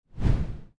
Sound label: Tittle Transition